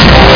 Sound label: CHIME